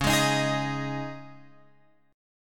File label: Db Suspended 2nd, Guitar, Open F, Dbsus2 chord; strummed; JGuitar